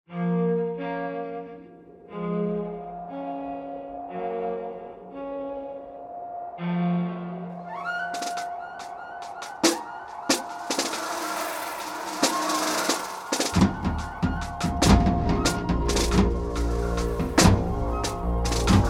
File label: thor thunder